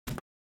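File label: Buttons click; coolmathgames; truck loader 4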